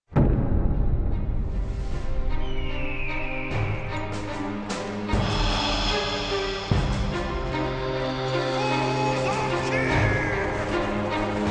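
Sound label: Orchestra Medieval Warfare; Chivalry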